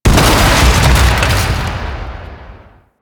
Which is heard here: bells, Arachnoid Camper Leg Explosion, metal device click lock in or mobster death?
Arachnoid Camper Leg Explosion